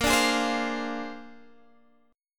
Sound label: Bb 9th Bb, chord